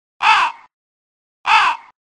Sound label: crow